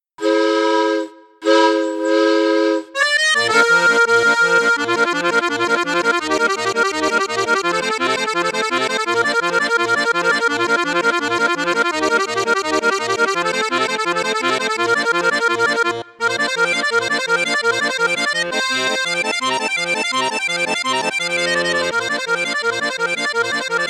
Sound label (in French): registre flute juste flute haute, cavagnolo vedette accordeon; cavagnolo vedette 10 super